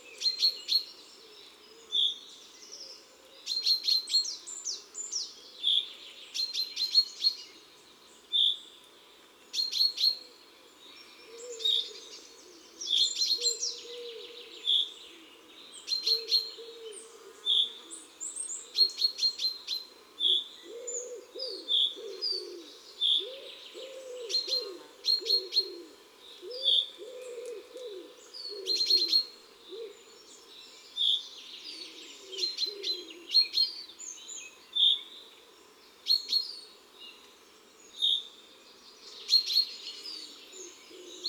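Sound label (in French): Pinson des arbres, Chants d'oiseaux des bois et des forets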